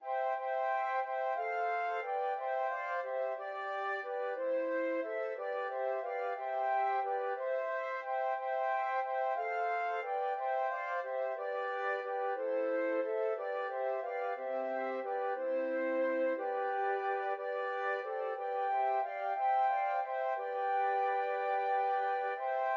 sussex carol, flute quartet

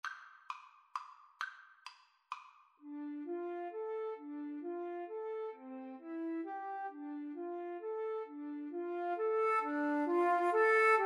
scarborough fair, Flute Duet

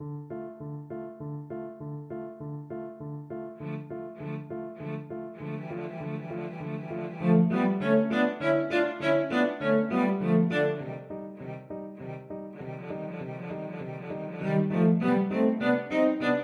VLC duet, cello duet; chanson boheme

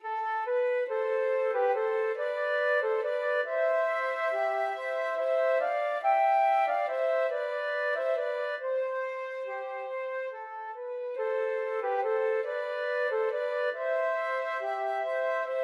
respighi siciliana flute duet

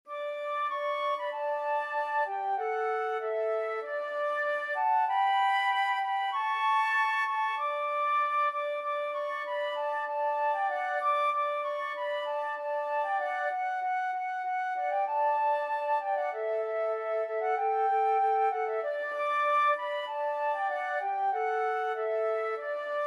joy to the world, flute duet

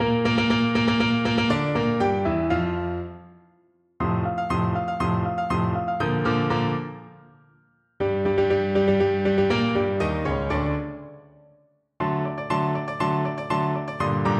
tenor voice

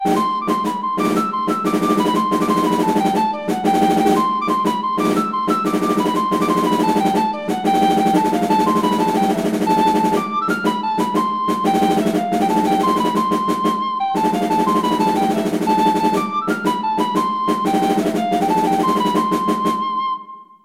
the roast beef of old england, fife; fife and drum

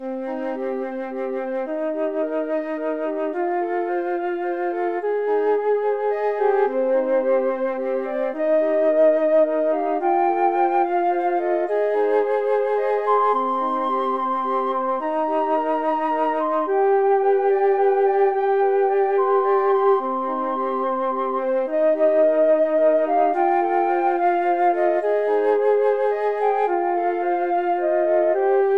house of the rising sun, flute trio

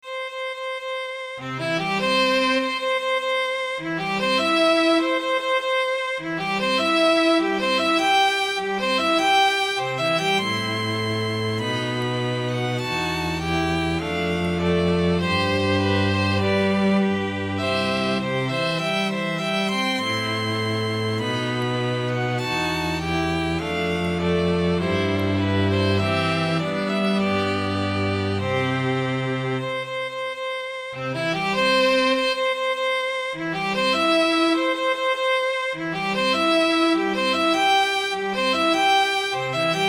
string quartet

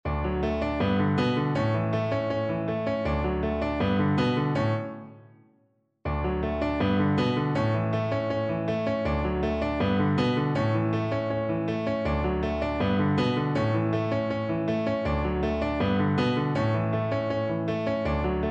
la bamba, Double Bass

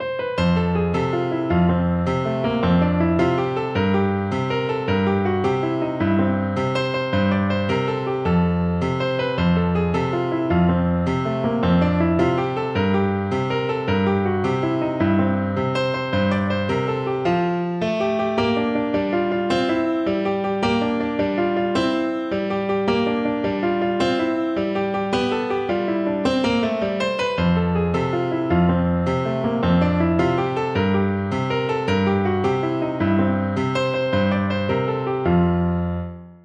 piano

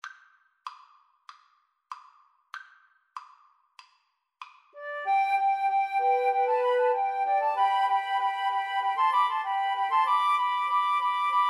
rejouissance, Flute Trio

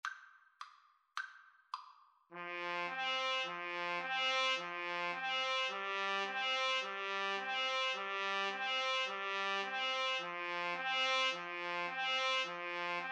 trumpet duet

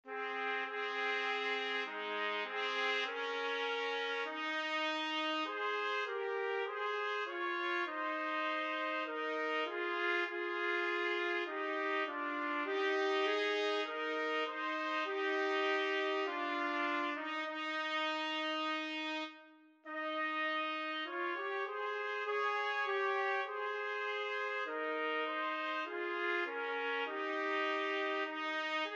o come trumpet duet

